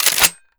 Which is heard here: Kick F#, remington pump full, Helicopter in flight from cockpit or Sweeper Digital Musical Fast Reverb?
remington pump full